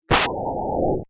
tree

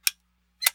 tape box; kontakt

